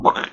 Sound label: Smashed Bug